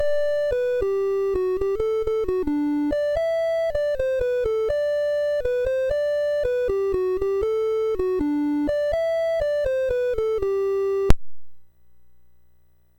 Dog BASIC, MOS 6581; MOS6581R3

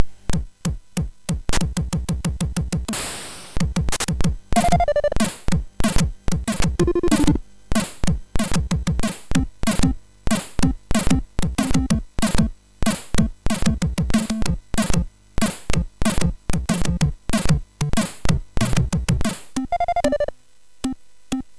For Break Dance, MOS6581R4; hvsc

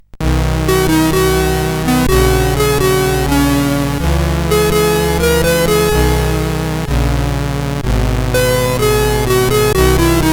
Advert, CSG8580R5; sid

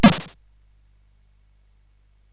Tecnetium, CSG8580R5

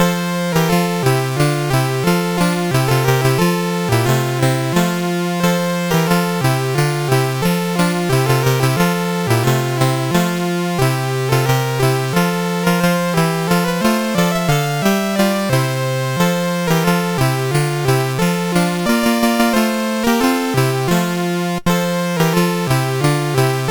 Deck The Hall, MOS6581R4; sid

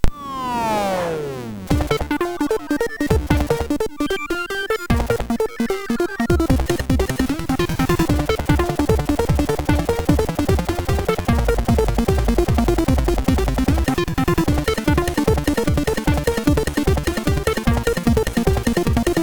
Phneumatic, MOS6581R4; hvsc

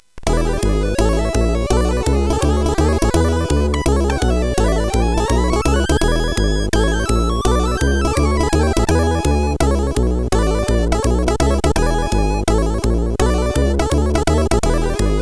Modern Jazz, CSG8580R5

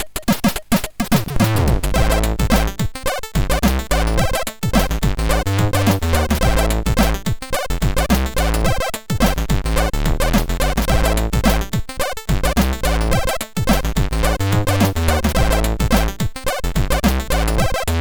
Groove, MOS6581R3; Cheesecats

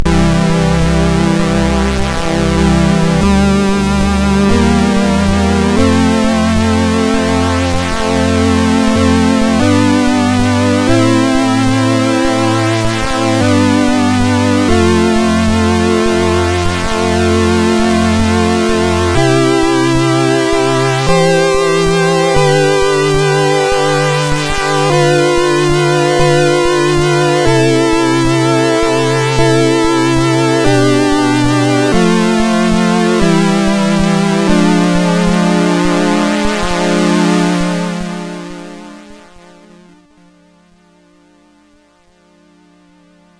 Old Music, MOS6581R2

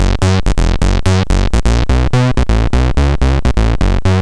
Simple Bassline, sid; CSG8580R5

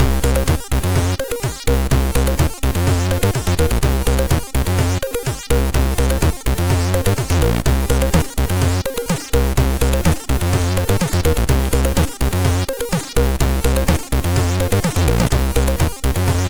Ice Cream Tune, MOS6581R3

